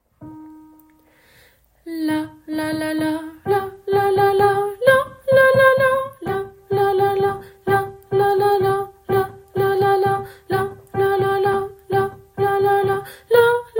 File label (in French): basse